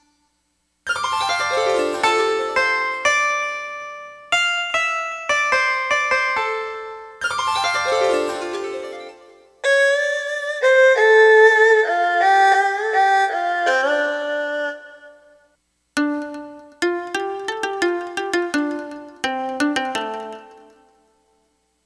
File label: voice samples; genos